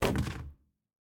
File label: Box Item Drop; MiSide